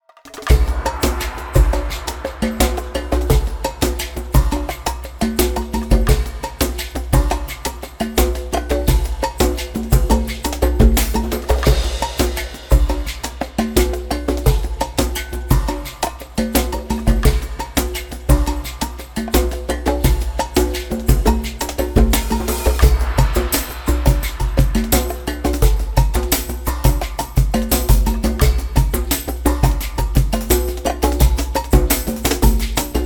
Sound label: Cinematic Drum Circle; latitude latin rock percussion for kontakt player